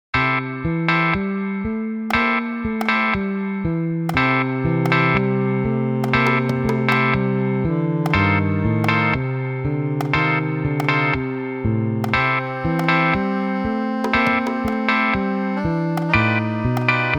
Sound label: MIDI blues